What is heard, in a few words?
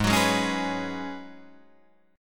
G sharp Minor 11th G sharp Guitar Drop D, chord